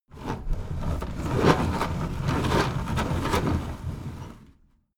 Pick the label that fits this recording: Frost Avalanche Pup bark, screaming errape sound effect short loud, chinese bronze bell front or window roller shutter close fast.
window roller shutter close fast